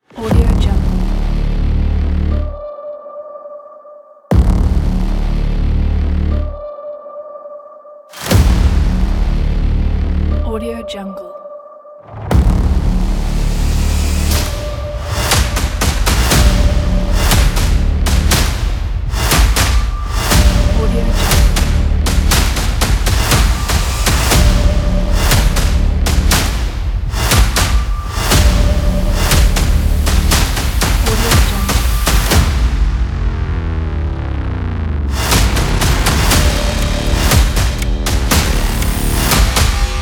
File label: sound design trailer